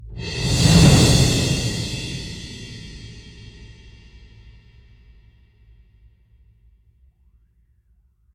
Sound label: Ledge Fall; Pillars Nilin; Remember Me